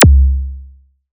ZAP, ZAPS THUMPS; Best Service Alpha Dance vol.2

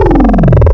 Amp Mod Cplx, wavetables; Animoog

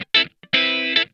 TIGHT, Funk Guitar; Zero G - Funk Guitar

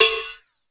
MONDO PERC, METALIC DRM; Crypton - DrumBase5000; METALIC DRM2

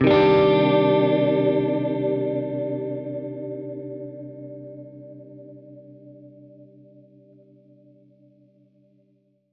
Gretsch Chord Cmin[add9], Guitar Chords; undercover samples

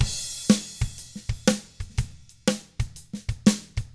122 SHUFLT, Double Platinum Drums, 122 SHUFFLED; ILIO

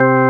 KEY, Organs; Club-50 - Foundations Roland

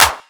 Clap; Equipped Music; Loungin House

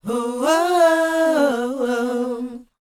WHOA B, Vocal R&B & Dance; ILIO; Vocal Planet

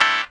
DR. LOOP; X-Static Goldmine 3; CHORDS

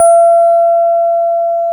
KEY EP D#4, Digital EP's

